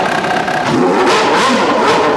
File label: start, Ferrari; F1; FSR 2010